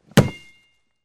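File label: kick of a ball with ringing; Звуки футбола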